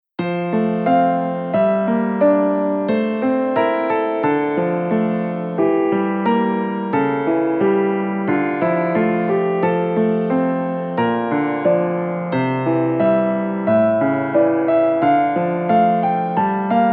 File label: piano; maoudamashii